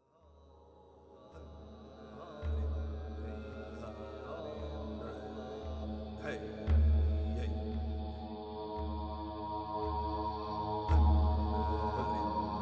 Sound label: heart drum on 4 mantra and overtone